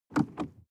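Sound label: sports car interior pedal gas step and release; autos; verkehr transport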